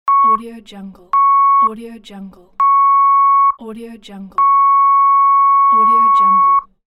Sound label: censor beep